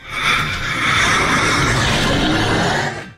roar

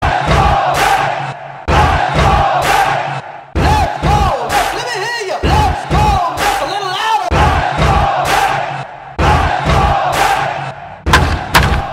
lets go mets chant

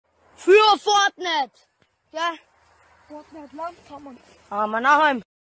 hahahahaha; fortnite

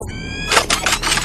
transform sound; transformers

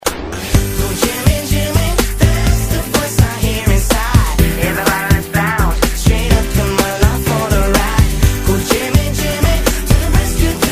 cool music

